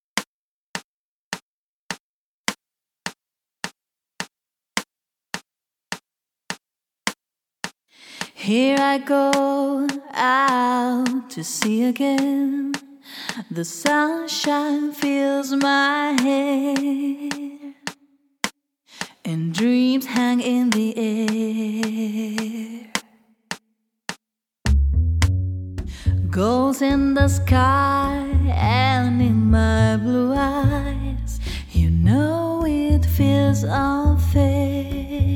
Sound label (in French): sans guitare